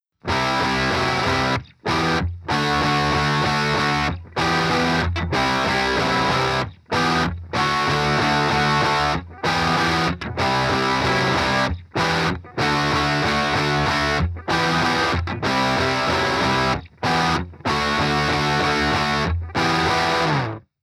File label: Rhythm Reamp